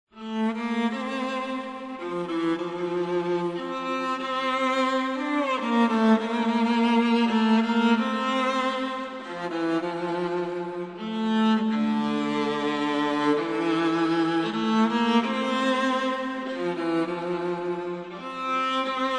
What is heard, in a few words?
String Ensemble